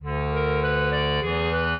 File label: minuet, clarinet; Mozart